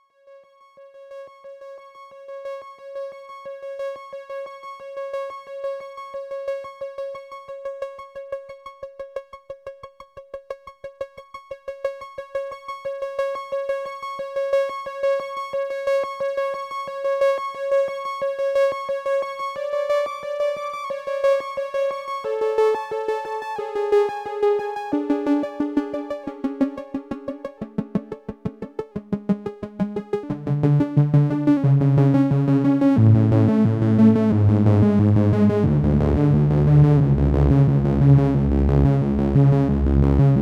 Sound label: vcADSR by LFO